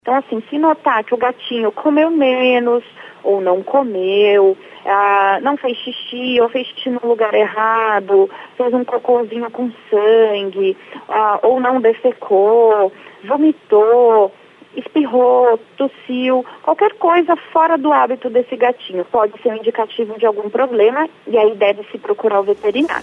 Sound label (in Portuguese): gato